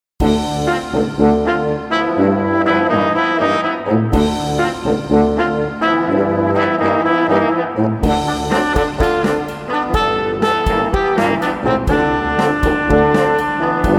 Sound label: Gimme some Funk